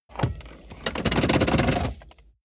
car bmw e46 interior seat pull forward completely rattle